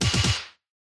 Hit

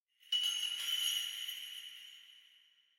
Kagura Suzu

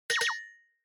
Onoma Surprise Low

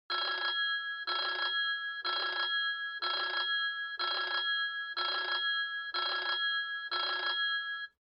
OS Sound Speaker Ring

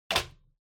Bingo Machine, Put

